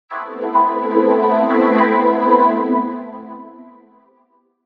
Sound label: in Sweet Strings, Vocoder